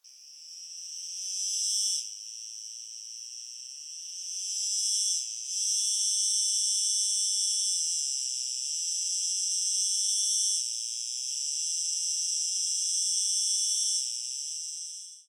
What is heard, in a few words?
ambient cricket; STALKER